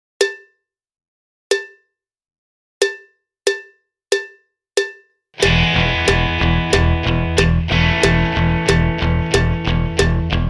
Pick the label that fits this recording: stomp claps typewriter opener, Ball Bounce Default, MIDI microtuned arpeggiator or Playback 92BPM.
Playback 92BPM